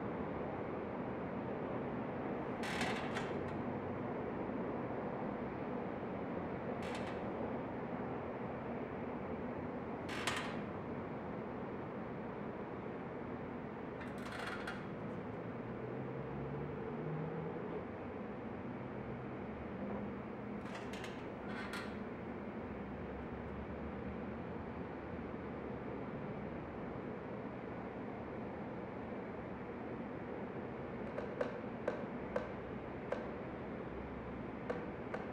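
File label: Ambience Office; Beholder 2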